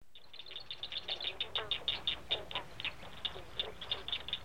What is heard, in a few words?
blanchard cricket frog